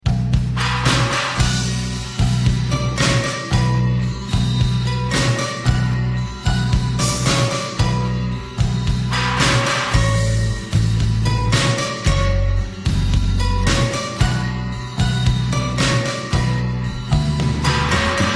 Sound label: Kyoto Song, yamaha dx7